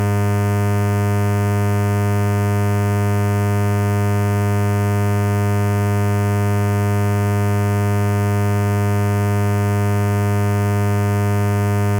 Stylophone S2 Square; Dubreq

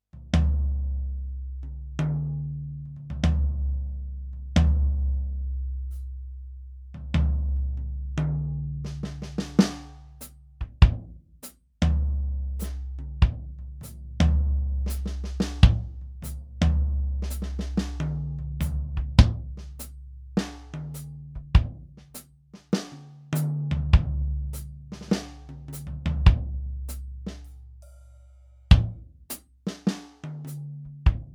Tru Acoustic

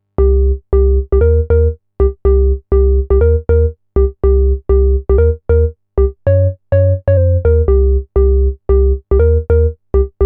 Pro-One Organ; SCI

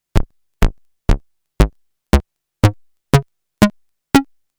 Short Attack

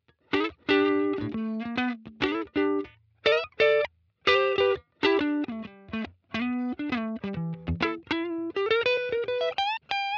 KATANA FENDER

